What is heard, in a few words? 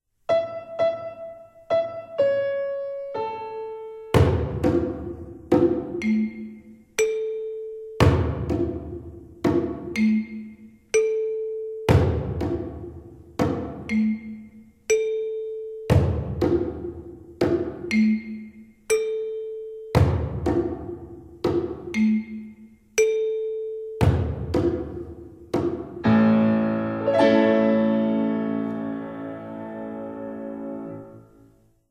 The Second Twinkle Rhythm